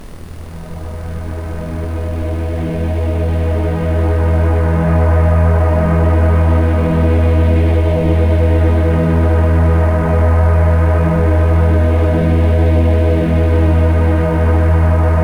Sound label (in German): Rife Klarheits Kick mit Ambient Sound; rife frequenzen